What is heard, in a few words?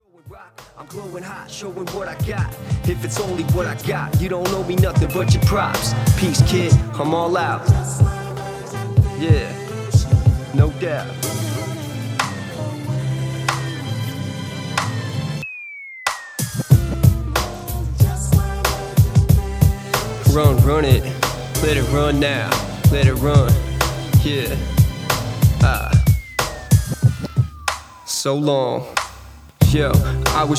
Hiphop